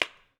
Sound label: spyder no clip, guns; spyder se no clip edited no vol change